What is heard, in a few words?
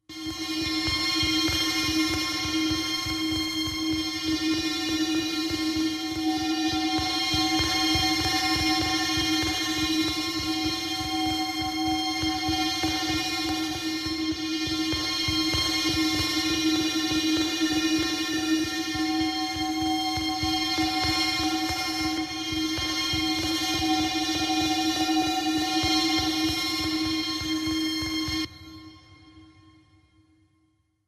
Concern Cutter Machin, alarms